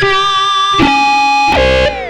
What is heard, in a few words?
xtra lead demented; Sonic Foundry (Sony Creative Software); Ugly Remnants VOL-2